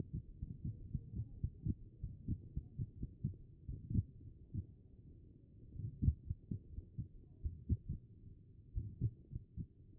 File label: HEART B MODE